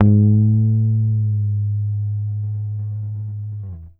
G#1 GTR TRIK; Univers Sons; Jazzistic

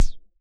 Pulse & Noise; 155 DJX Kit

